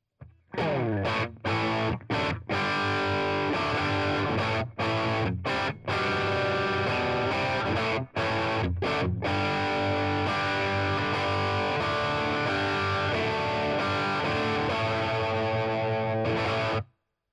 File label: Line 6 Catalyst Voltage SM57